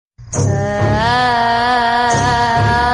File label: devil sound notificatio; kanchana